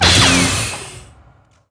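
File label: Light Saber wall, Contacts avec d'autres sabres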